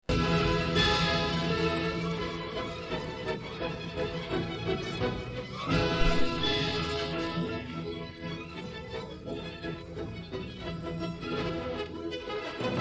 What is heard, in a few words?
no vocals; badsanta laurengraham